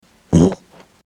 loud fart in gym

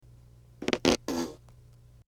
silent but deadly fart

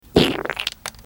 loud wet fart

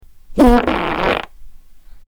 loud fart prank

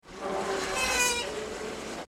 squeaky fart in school

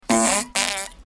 fart during workout

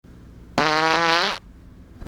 loud fart blast

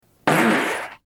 long fart in public

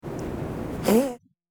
stinky fart in car

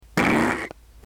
quiet potent fart

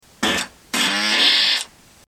mechanical fart noise

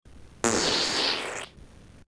morning fart sound

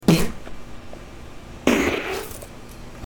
fart at party sound

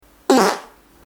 fart in classroom

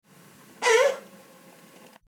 squeaky fart in car

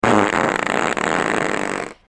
long fart sound

